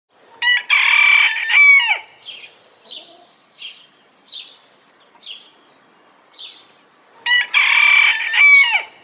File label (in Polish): kogut; pije